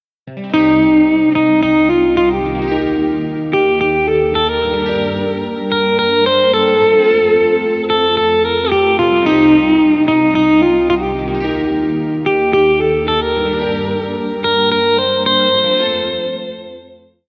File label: Play guitar